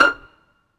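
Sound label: strings F1, String Pizz, AKAI S-1000; Retro Sampler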